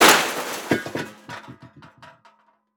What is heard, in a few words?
Crash Car With Rim Rocking On Pavement Short; Crashes